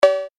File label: cow